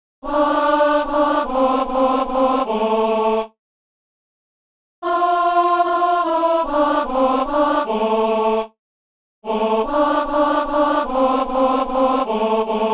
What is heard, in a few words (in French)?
toutes les voix; Messe du 17 janvier à Saint-Denis